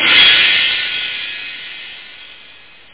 hihat crash

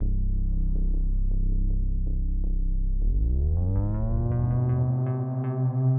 SPHERE, Ambient; eJay

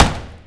mattock; wob2 demo

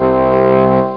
Organ-Hall; HyperComposer

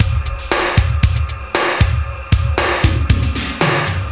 breakbeat; World of Sound; instruments; beats

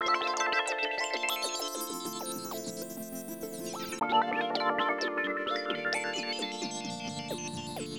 Synt; SYNTH; 120 BPM

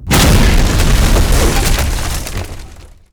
Explosion Wood; 1944 Battle Of The Bulge

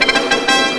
Flourish; Atomic Structure 2; Chemistry

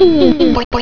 Pac Death; Centaurian

